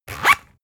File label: zipper close harsh